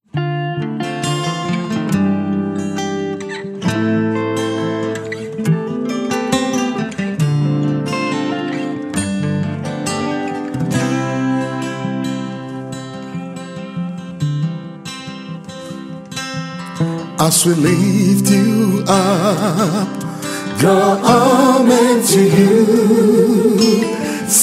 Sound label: Rain Down Acoustic Version